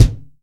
clean kick single hit c sharp key, kick drum; kick drum samples

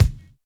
airy kick drum sample a key; kick drum samples

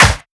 crunchy steel snare drum g sharp key; Duration 0:01

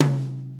old school reverb tom sound c key

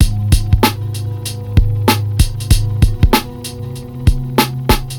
96 bpm drum groove G sharp key

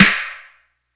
long tail reverb snare drum g key; snare drum samples

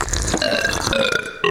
multiple echoed belches

